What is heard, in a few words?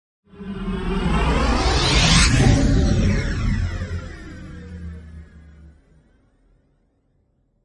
Storms Meteor